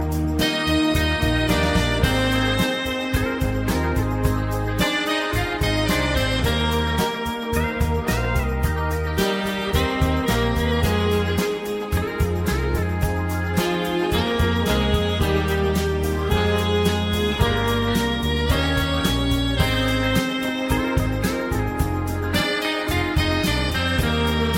Train Melody; Osaka

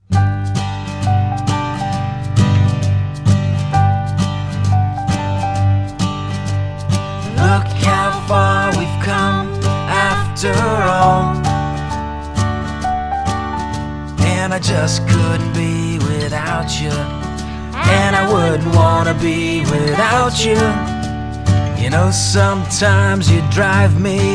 Is Calling Ska, ringtones

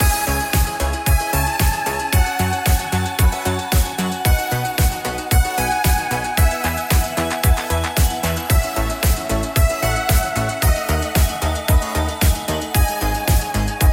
Romantic

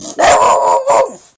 beagle barks; animals

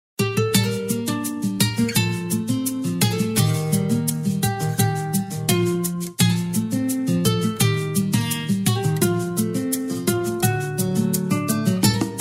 Beautiful Guitar, ringtone

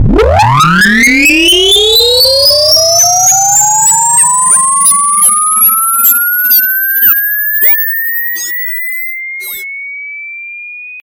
SUPER SIREN

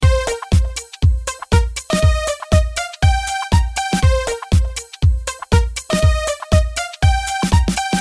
80s Hits

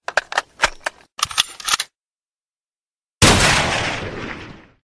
Sniper Sound

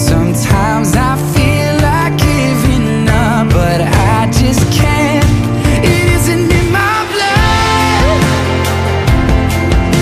Ska

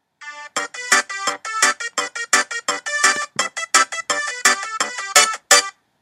Happy Ringtone

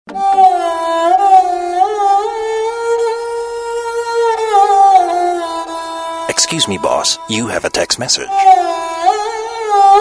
Rhytmic

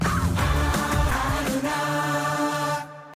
Jingle

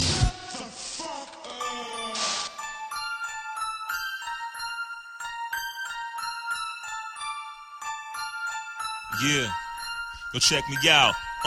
gangsta beat; hip hop

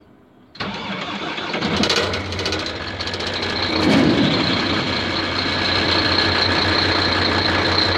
Scania Irizar

